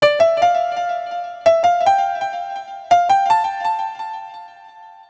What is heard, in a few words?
80s Digital Piano